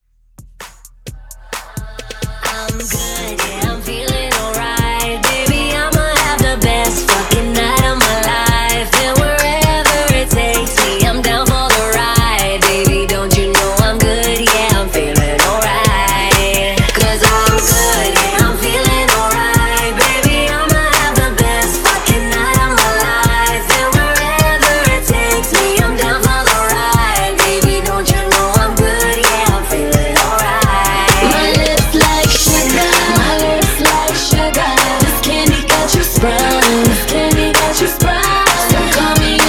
Mashup Re Drum Clean 130 BPM

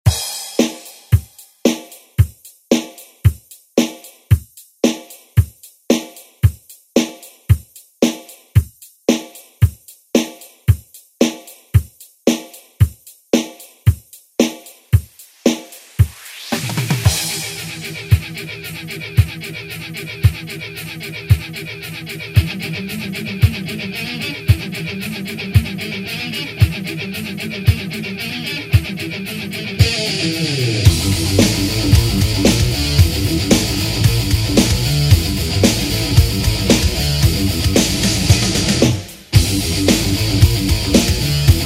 90s ReDrum

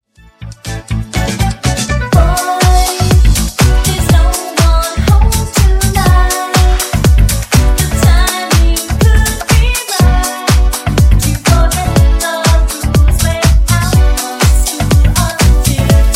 Funk Nudisco, 122Bpm

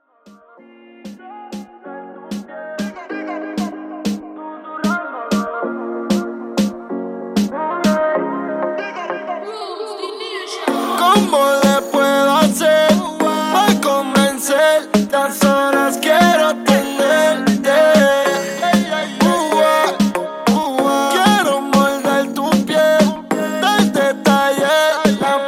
Reggaeton 95 Bpm

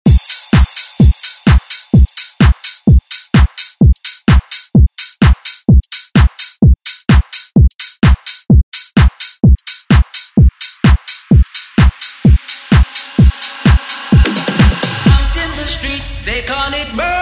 ReDrum; DJ Mhark

